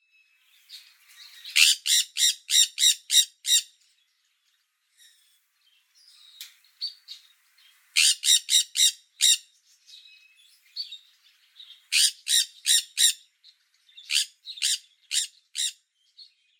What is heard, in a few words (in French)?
Conure soleil ARATINGA SOLSTITIALIS; oiseaux exotiques